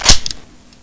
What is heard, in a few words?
close, sawedoff